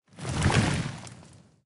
armor; smite; geb

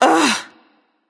"ugh"; smite; yemoja

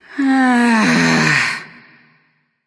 sigh; discordia

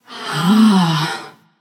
sigh passion; chaac

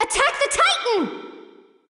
vox attack light; smite; hel

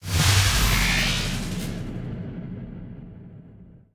projectile; Smite; god Janus

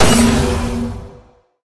activate; smite; daji

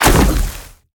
impact; smite; Anhur

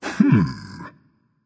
thinking berserker; cuchulainn

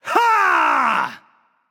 battle cry; smite; loki

